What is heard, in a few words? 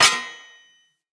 Long Sword Impact Block Defender